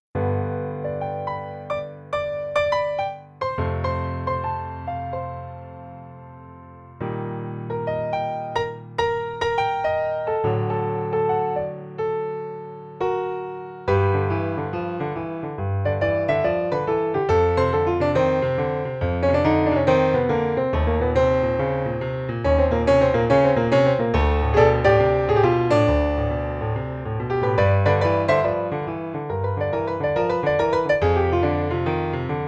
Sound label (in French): Eight bar boogie, Piano seul; Jazz